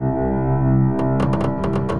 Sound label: Scan Cloud Mid, Granulator; Ableton; Granulator III; Device Templates